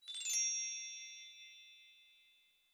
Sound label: zap rod; angband